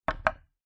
foley small stone set down on wood

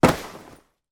foley bag holdal polyester foot kick; zapsplat

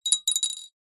foley glass marble drop into empty glass bottle; zapsplat

